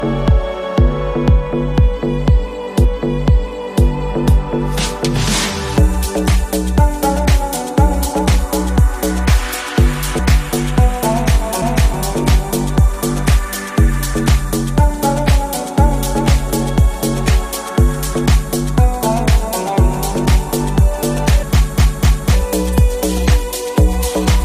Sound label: music ringtone